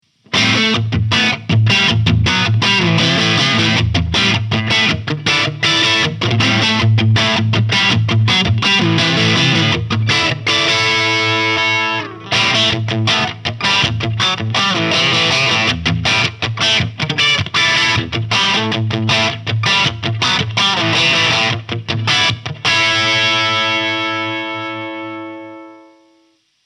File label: Fender STRAT Crunch; AV II 57